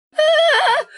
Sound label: ahhh; UDK